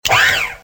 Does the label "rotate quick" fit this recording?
Yes